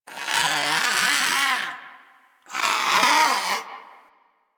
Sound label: Landscape Close Critter; One Offs; Warframe; Venus Open World